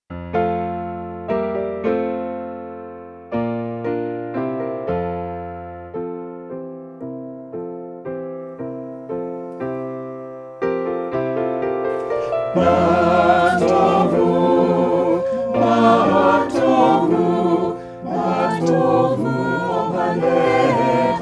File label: MA TOVU CHOIR